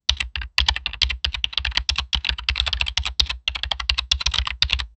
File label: fast keyboard typing; writing